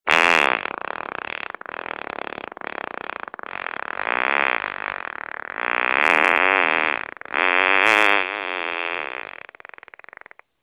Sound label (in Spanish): Extrasuperpedo